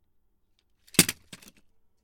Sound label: Gun Dropped on Floor